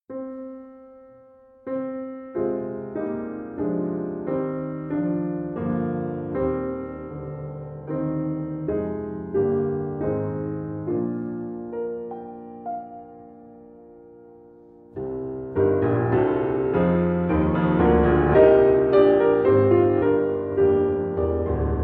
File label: Variations for Piano Variation 3 Andante